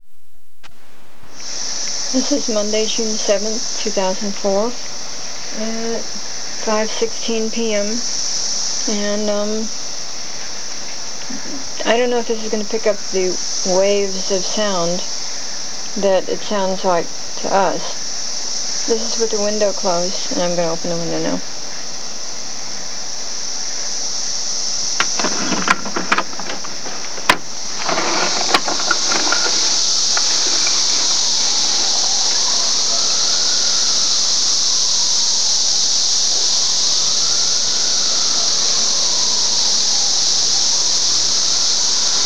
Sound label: cicadas